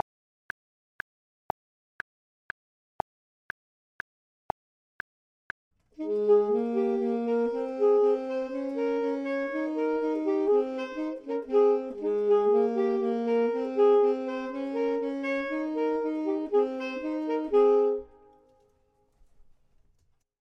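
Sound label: both, Saxophone and Clarinet; voxman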